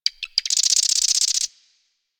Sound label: Forest Bird Squak; Ambience; Warframe; Grineer Forest